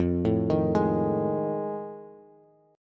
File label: F 7th Sharp 9th, Bass; F7#9 arpeggiated